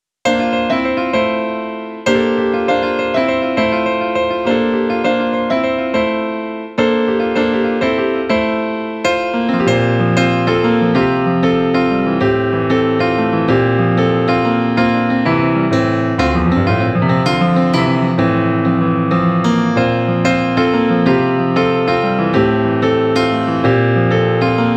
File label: piano